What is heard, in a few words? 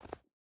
footstep boots snow jump start; Rust